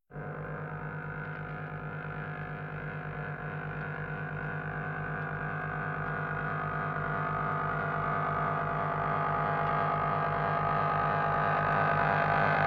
Laser Kanon, science fiction effects; KMP Film Video Sound Effects

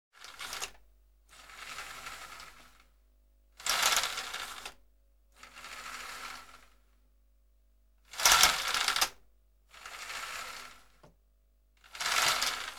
Blinden Open En Dicht; KMP Film Video Sound Effects

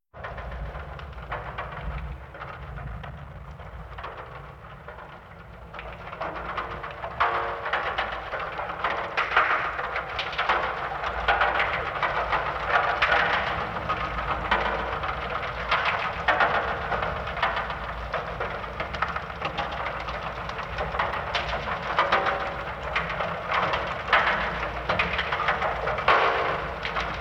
Meteoor, science fiction effects; KMP Film Video Sound Effects

